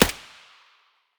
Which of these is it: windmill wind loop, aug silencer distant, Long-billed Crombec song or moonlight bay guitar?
aug silencer distant